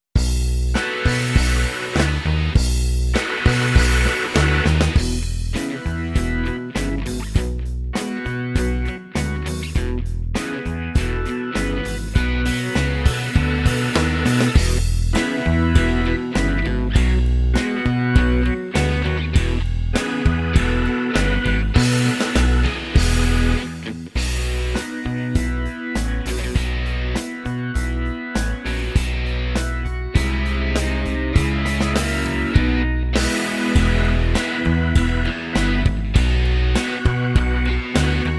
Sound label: Beethoven boogie backing track